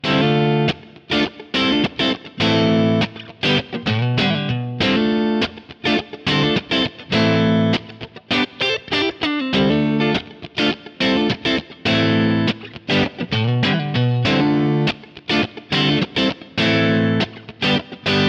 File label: harley benton st 62 mn vw